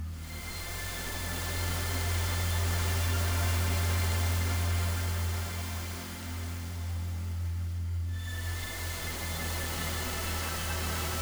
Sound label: Crystal Ambient; tinkertown windows gamerip 2020